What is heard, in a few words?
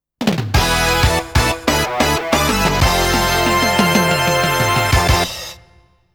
Goal In